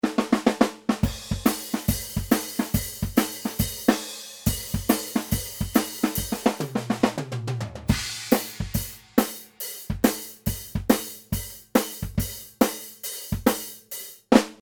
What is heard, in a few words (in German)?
Drums Raum Mono Original